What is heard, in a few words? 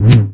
ROCK